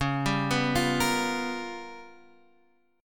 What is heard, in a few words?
G 7th Sharp 9th Flat 5th